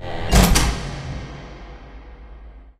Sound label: push bar door close